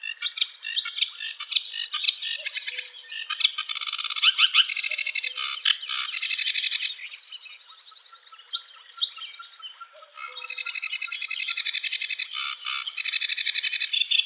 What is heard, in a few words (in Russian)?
kamyshovka barsuchok, zvuk kamyshovki